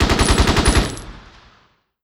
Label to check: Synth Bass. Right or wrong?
Wrong